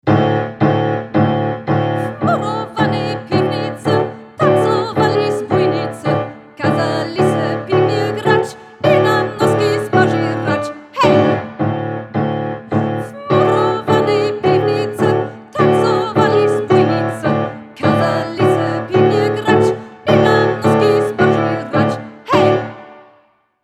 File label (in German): W murowanej komplett mit Gesang schnell